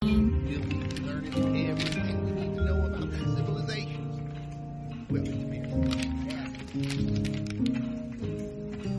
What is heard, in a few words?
paper; before your eyes